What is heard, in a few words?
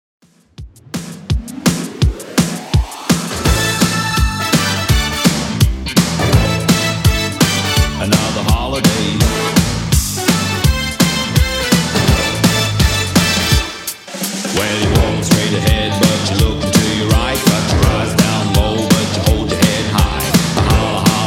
80s Redrum 167bpm